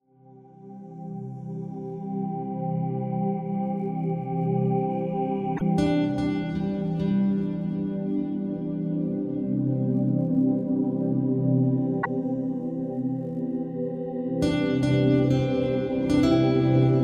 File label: Eternal swells